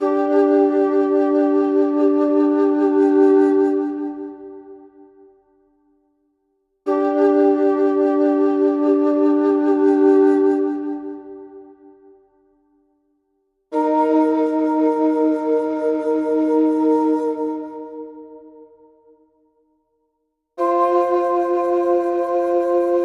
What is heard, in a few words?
Epic Shakuhachi